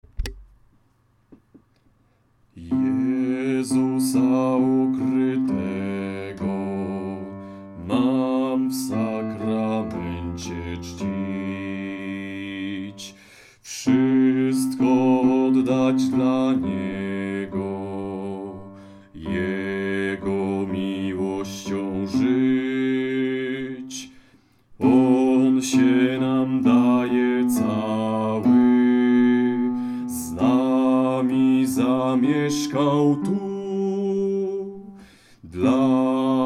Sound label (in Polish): bas; Jezusa ukrytego